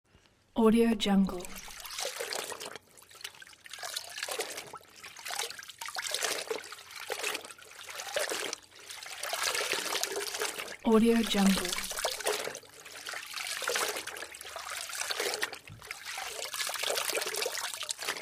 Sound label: washing